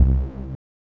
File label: SNTH BASS ELCTR 140 A, Techno